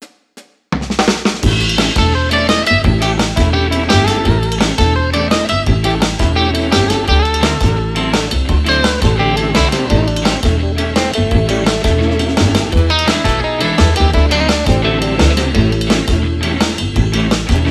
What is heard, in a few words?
cheerful entertainment dual solo; by burak sound bank; cheerful vol 8